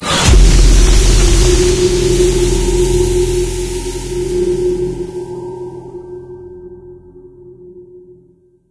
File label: vort extract, ambient; video games; source engine; portal2; outland